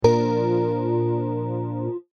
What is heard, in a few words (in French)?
guitare